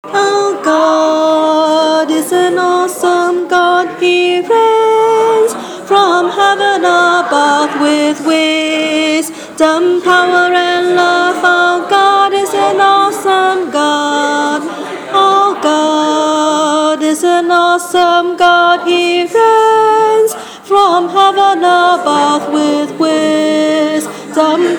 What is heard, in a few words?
alto